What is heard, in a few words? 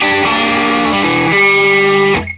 SOLO G, Vintage Blues Guitar; USB Soundscan vol.22